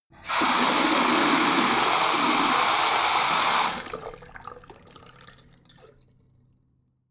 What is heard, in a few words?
tap flow ultra hard short, badezimmer